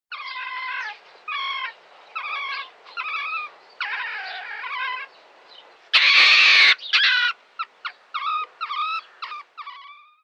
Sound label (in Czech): hlas zvuky zvirat